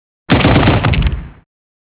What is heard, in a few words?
Machine Gun